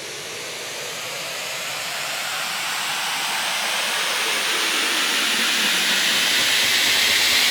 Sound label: Grit White Noise Up; House; FX